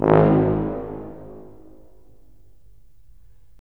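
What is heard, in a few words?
brass tuba; LA Composer vol. 3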